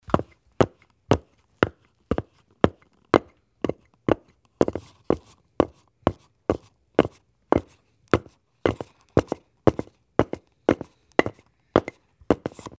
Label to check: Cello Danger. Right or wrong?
Wrong